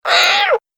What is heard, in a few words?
Nasty Little Monster